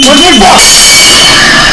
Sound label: Never Enough Tracks Of Just Cymbal Crashes